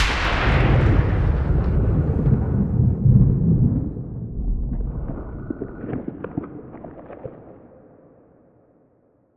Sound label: sfx amb vfx thunderclap; ambient; System VFX